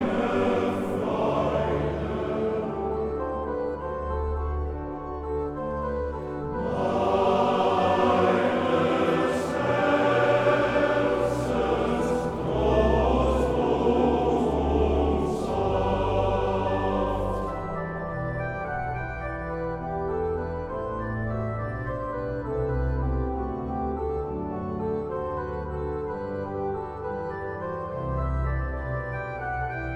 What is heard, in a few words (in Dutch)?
Koor (mannen); Mastreechter Staar